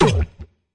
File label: fx drum